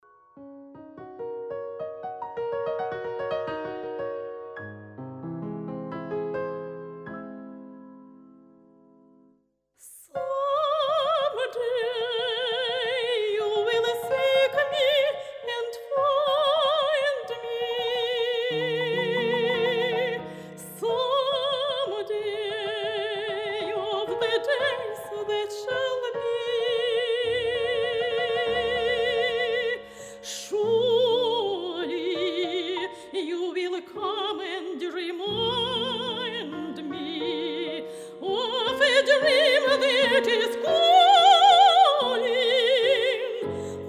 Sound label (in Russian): vokal klassika